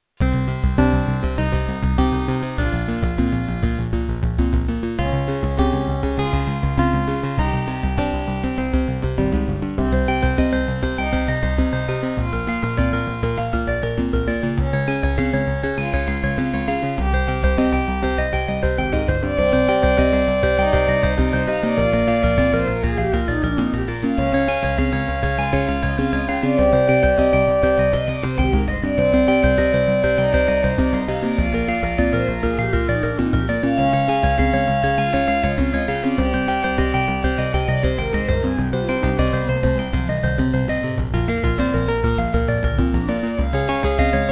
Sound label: Beepblaster 2.0 SYNTH MODE; Hocus Pocus